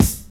studio kick drum single shot d key, kickdrum sound tuned to the D note